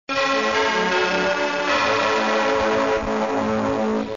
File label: Game Over; Glider Rider